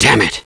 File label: pain; quake2; caleb